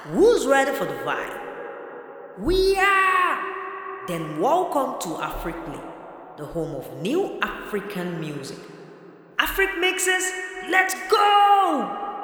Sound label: Jingle